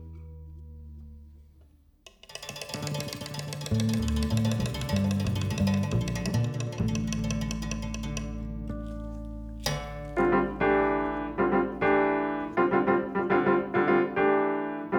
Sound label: Berimbau